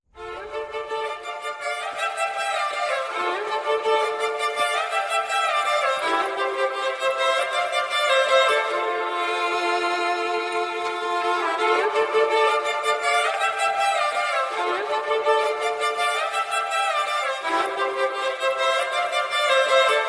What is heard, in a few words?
Theme; Janatha Garage